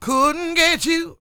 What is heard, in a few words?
SING W, Vocal Planet Blues & Soul, BLUESY; ILIO